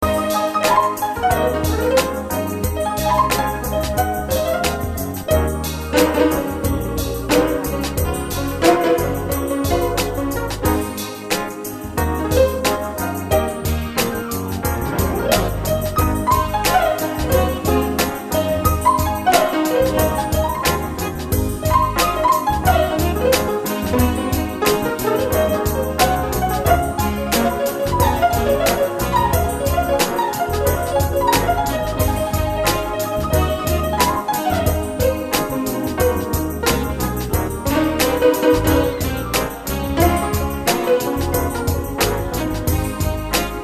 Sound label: Blues